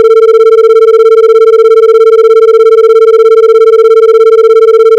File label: Autogenerated sine 120 cents